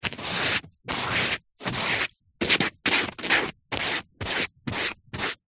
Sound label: office paint brush artists brush strokes on paper various